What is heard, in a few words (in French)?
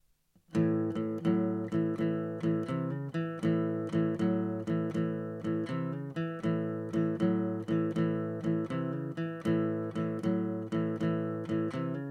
shuffle tierces mélodie